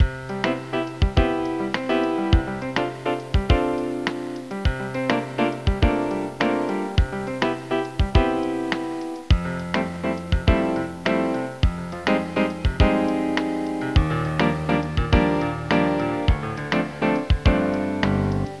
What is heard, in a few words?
tune; ClarisDraw Intro; ClarisDraw Trial; Claris Demos; C'Draw